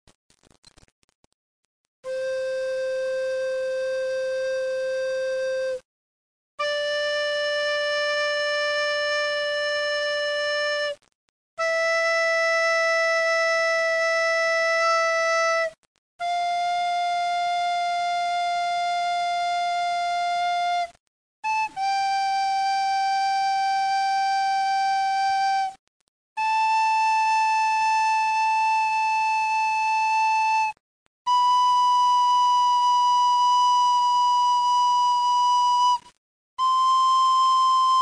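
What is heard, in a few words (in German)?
Blockflöte, Tonleiter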